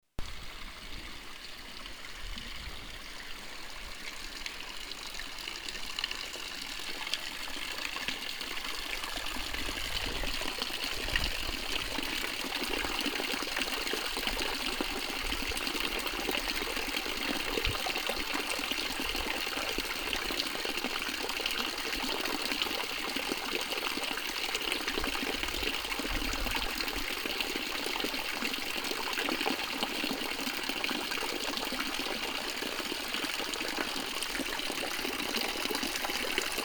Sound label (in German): Sparkassenbrunen in der Sparkasse Promenade